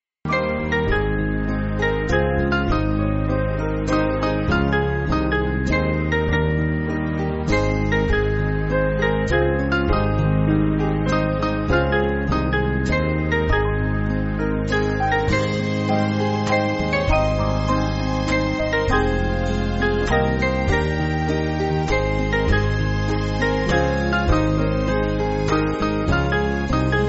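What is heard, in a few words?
Band, Backing Files for Churches; Saviour More Than Life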